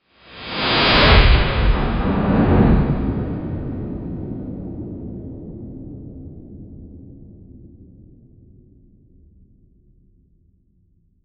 slow motion blast

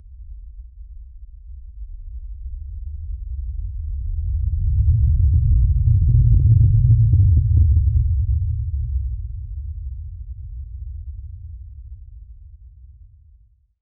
a distant low frequency, drones

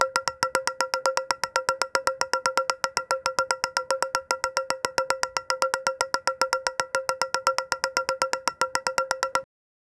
a metallic ball rolling; ambience

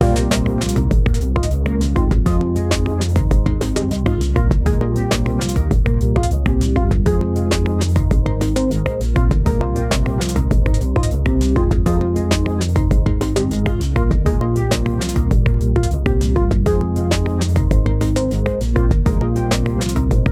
upbeat funky rhythm

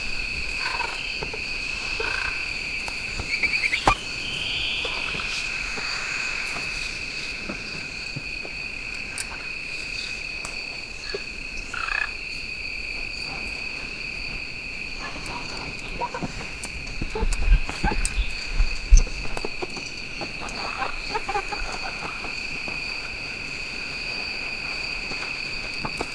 prehistoric

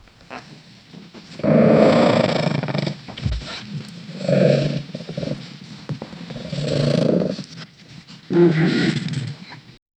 dog struggling to drag, clumsy

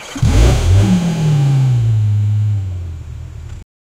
2000 hp hypercar; engine sounds

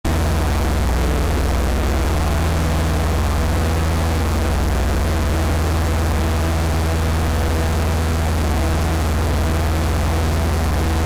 boat sound; sound effect

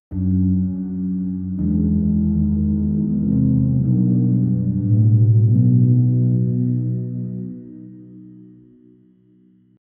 dramatic emotional tensio

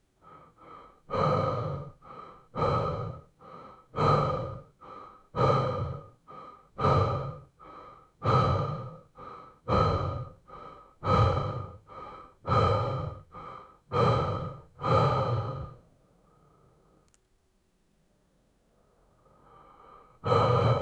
the mans rhythmic deep br

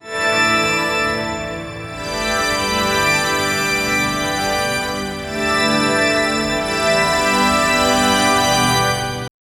general midi, organ; flex plugin

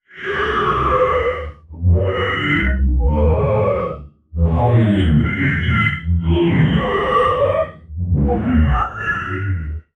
evil ritual

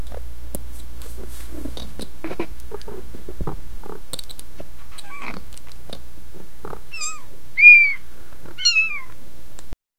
two small kittens playin; domestic animals

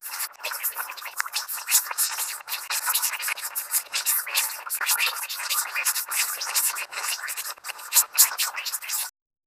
airy swirls of whipped cr, whipped cream

